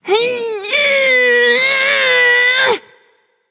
grunt lift; smite; voicepack; scylla

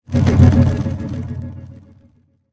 projectile taunt; smite; raijin

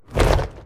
wing small; smite; god cthulhu

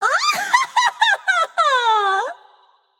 laugh; smite; izanami; diamonds

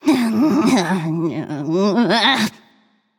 Grumble; smite; izanami

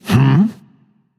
confused; chronos

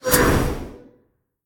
projectile; smite; gilgamesh

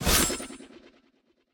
dagger spin; smite; pele

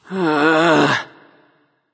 ugh; smite; nezha

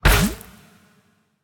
umbrella run impact; smite; vamana

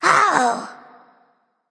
ouch; bastet

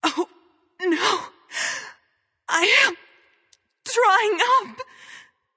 Death; smite; nuwa

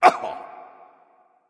grunt hurt; smite; npc; olympus

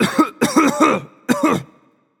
nv clear throat; smite; voicepack; mercury

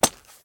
sword grab; smite; god arthur

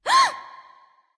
nv breath; smite; awilix

